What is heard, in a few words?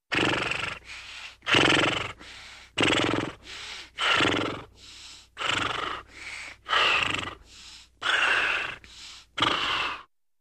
Horse Breaths Heavy